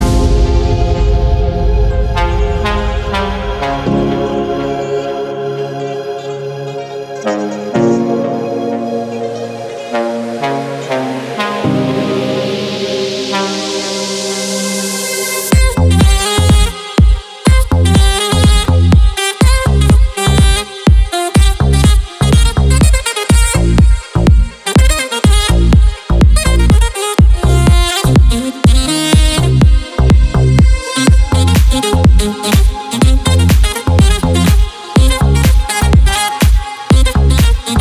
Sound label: speed up